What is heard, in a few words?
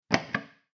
door